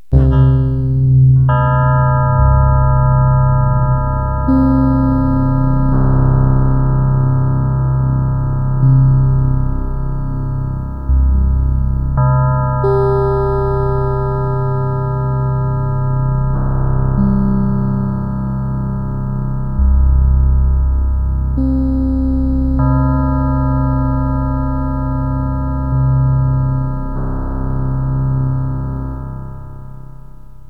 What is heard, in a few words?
GONGS